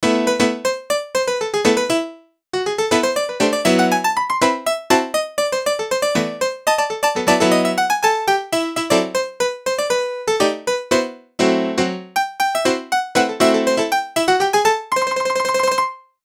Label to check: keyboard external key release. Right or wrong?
Wrong